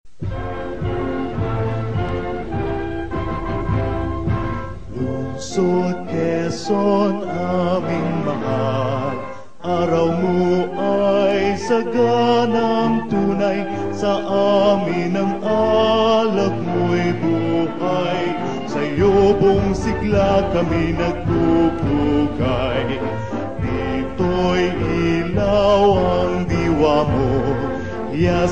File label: hymn; quezon city; filipino music